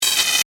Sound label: Static Buzz, Production, Element, Static, Buzz; Sound Design